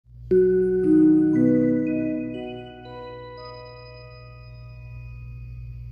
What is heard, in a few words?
Bells; GrageBand